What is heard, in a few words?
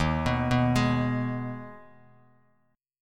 Eb Suspended 4th Sharp 5th down Bass Standard; Ebsus4#5 arpeggiated; JGuitar